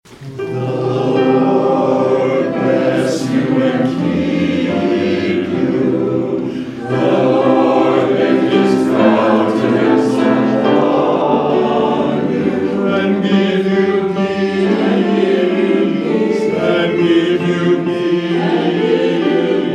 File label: Hymn; The Lord Bless You and Keep You